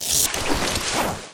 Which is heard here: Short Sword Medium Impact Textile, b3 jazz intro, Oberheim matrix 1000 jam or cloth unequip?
cloth unequip